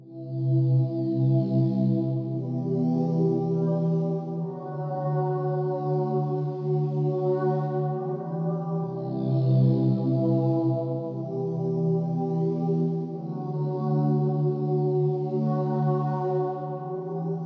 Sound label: 110 BPM E Min Pad; Cymatics